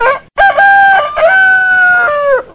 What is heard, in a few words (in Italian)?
Pollo